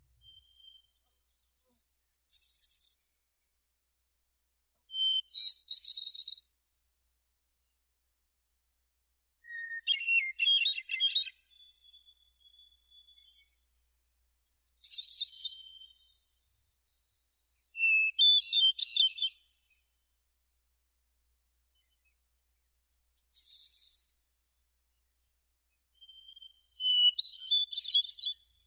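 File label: hermit thrush, Catharus guttatus; drozd otshelnik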